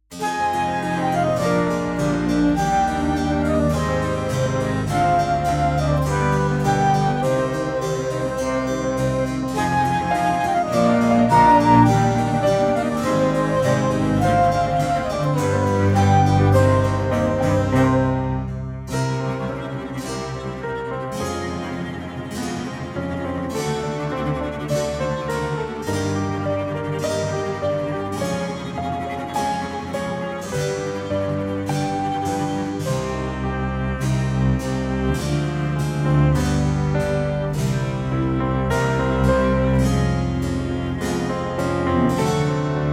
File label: String Quartet